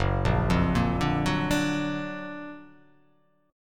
F sharp Augmented Major 7th F sharp

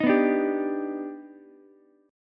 Eb Augmented Major 7th Eb down Ukulele; Eb+M7 strummed; JGuitar

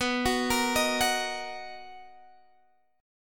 D sharp Minor B, Guitar

